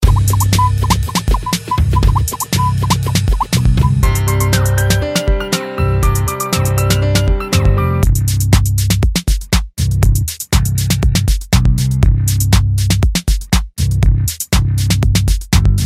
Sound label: Cool Beats